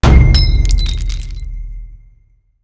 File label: drop jewel